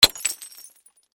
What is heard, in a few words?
Impact Beer Bottle, Smash; Smashes; Objects; BlastWave